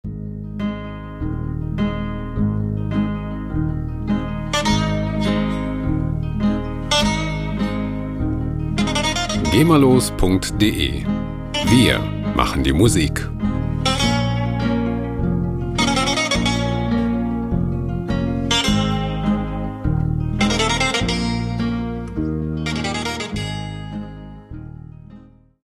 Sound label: sirtakis